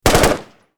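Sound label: m 16 exterior shots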